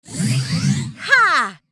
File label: Mage F Cast B